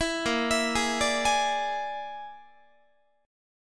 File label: Bb 7th Flat 5th Bb, Guitar; Bb7b5 arpeggiated; 19,18,19,18,18,19